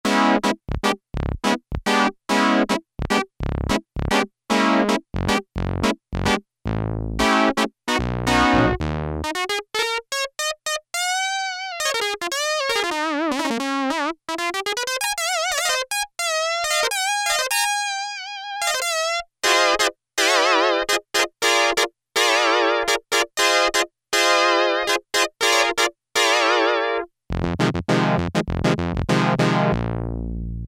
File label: BRASS 2, Yamaha DX7